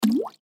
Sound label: Drip Drop Plop, Water Drip Drop Plop; Dripping; Blastwave